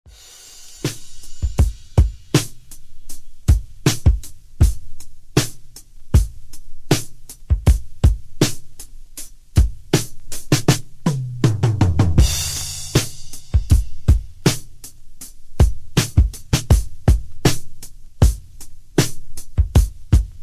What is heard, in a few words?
percussion drums; bruton